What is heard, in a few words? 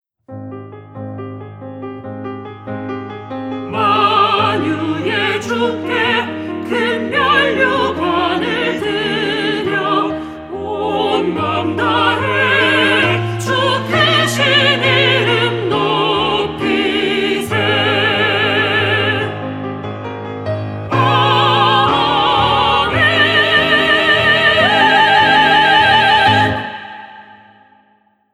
CHOIR